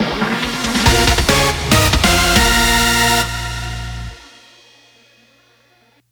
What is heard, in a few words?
Victory